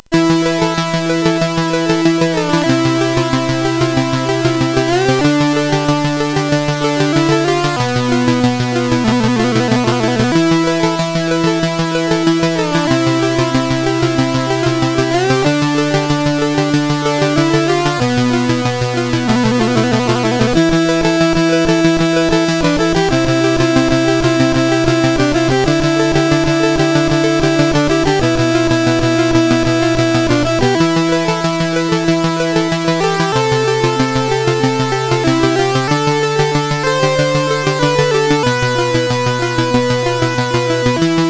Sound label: Deviants, CSG8580R5